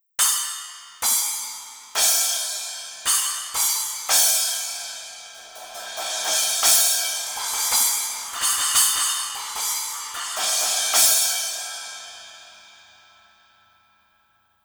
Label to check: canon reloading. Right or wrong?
Wrong